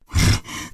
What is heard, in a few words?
ogre hurt; minecraft; technic